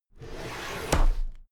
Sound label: drawer cabinet paper open heavy